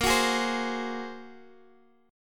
A sharp 7th Suspended 2nd Sharp 5th A sharp, Guitar Standard; strummed; JGuitar